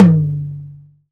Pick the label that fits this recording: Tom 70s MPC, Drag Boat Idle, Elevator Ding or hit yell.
Tom 70s MPC